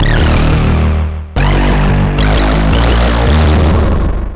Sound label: Synth Bass